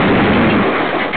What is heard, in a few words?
carcrash; DTMedia; Studio⁄1